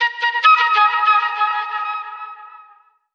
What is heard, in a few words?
flutey attack